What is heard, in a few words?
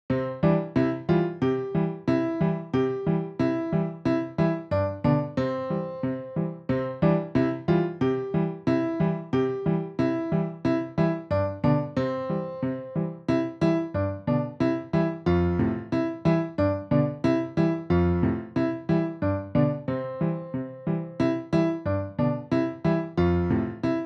piano 91bpm